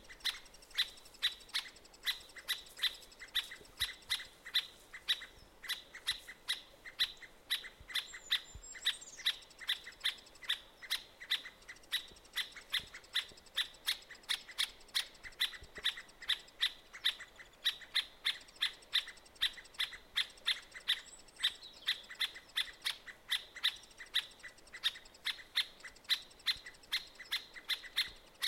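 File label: trehpalyy dyatel golos, three-toed woodpecker voice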